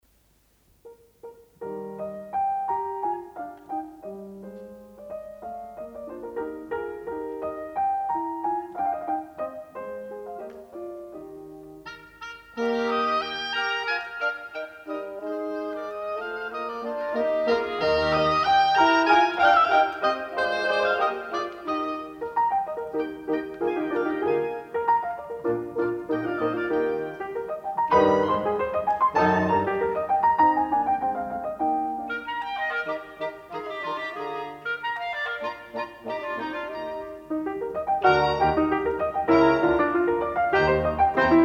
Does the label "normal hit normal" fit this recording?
No